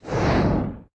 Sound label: Fire; The Denpa Men; Android